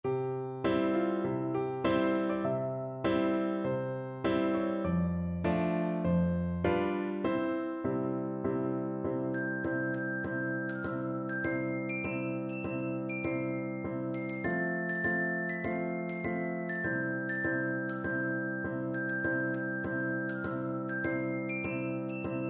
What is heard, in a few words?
GL; percussion